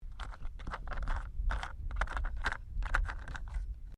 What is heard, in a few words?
Petrol Cap Remove, car; Hyundai Accent 2008; Hyundai hire car; Vehicles